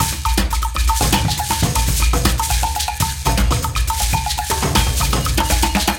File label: win big win loop mega; rise of maya